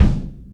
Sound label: kick sound C key